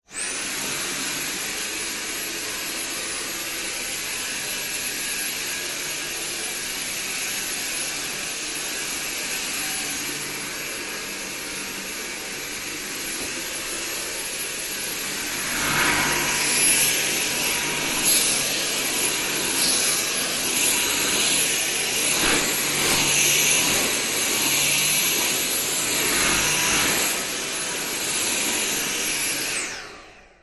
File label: household vacuum cleaner vacuuming around microphone; zapsplat